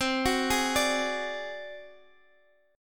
D 7th Flat 5th C, chord

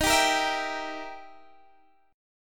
E Minor Major 7th Flat 5th Eb

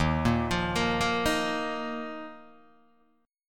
Eb Suspended 2nd, Guitar, Open D

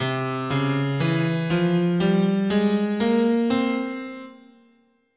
C Phrygian Dominant, scale

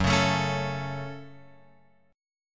Gb Minor, Guitar, Open F; strummed; x,1,1,4,4,4; JGuitar

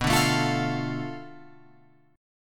B Minor 7th Flat 5th B, Guitar Open F; Bm7b5; strummed; JGuitar

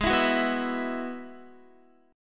Ab Minor 7th Sharp 5th, Guitar; strummed; 4E,4A,5D,5G,5C,6F

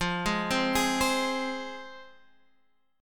F Minor Guitar Standard, chord; Fm arpeggiated; 13,11,10,13,13,x; JGuitar

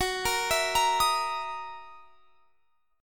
F sharp 13th F sharp down; F#13; arpeggiated

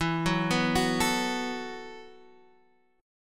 B 7th Suspended 4th E

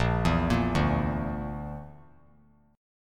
Bb Major Flat 5th, Bb, down; arpeggiated; JGuitar

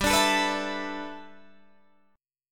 G 6th Add 9th, Guitar, Open D

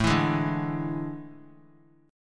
A Major 7th Suspended 4th; AM7sus4; strummed; JGuitar

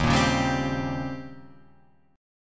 D sharp 13th D sharp

